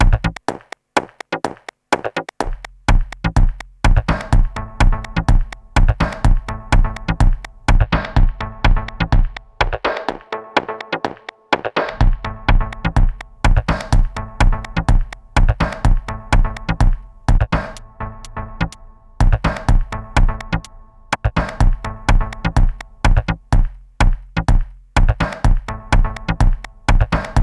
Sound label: Minimal